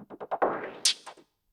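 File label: PERCUSSION ELECTRO 140, Techno Drum; Techno2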